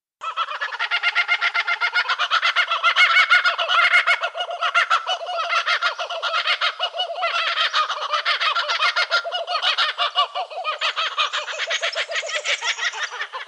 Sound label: laughing Kookaburra; kookaburras kingfishers bee eater and dollarbird